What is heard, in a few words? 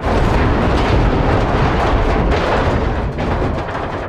damage vehicle; World Of Warships